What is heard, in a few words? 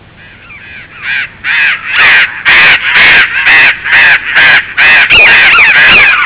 cyanopliseus patagonus